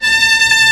CH V1, CHEMBERLAIN; AKAI S6000 CD-ROM - Volume 1; SYNTHESIZER

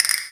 Castinets; musicradar; cartoon caper

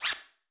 FX, 137bpm; Belfast; Old Skool House

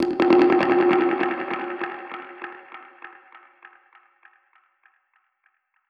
Perc Hit, dub percussion one shots; musicradar

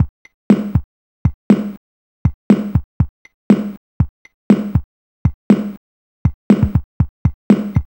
Apple II Breakbeat, Drums, Chiptune; Sonic Foundry (Sony Creative Software)

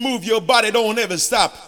VOX SUNG; Megabass Remix! 2

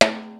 RY-30 PERCUS; Rubber Chicken Software Sample Bank

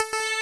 DG WAVE, DIGI SYN

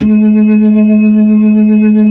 B-3, Blues; Roland LCDP10 Keys of the 60s and 70s 2

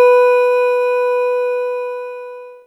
WINE GLS, Synclavier Sampler; Synclavier Sampler Library; KEYS AND GUITARS

